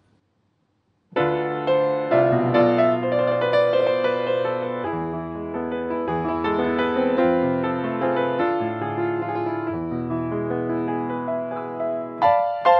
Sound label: piano